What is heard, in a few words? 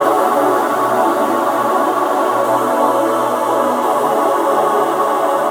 pads dry; folda; sentinel av